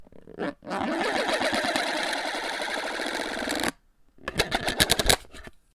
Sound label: serrated plastic disc spinning on plastic, A small serrated plastic disc rolled over a f